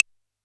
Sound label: D#5 Analog Claves, Yamaha PSR-E323